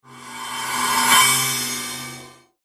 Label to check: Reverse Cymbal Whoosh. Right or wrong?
Right